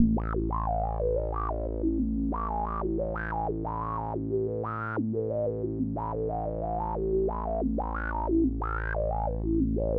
Bass Filter; supercollider

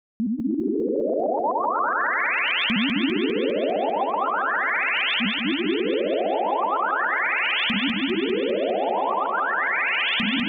Analog Bubbles; supercollider

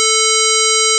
power odd sin, synthesizer; lange-nacht-2009

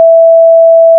sine high; lange-nacht-2009

